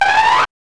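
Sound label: synth effect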